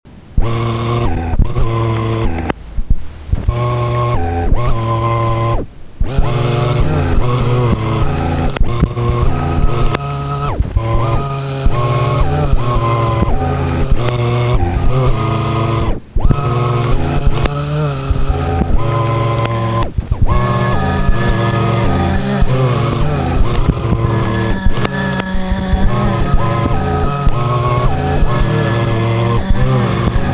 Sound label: Kind Huhohuheuuoh